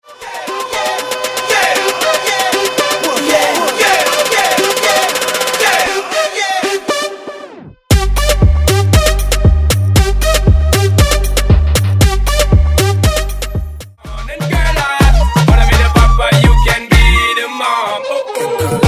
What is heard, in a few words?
Afro Beat Megamix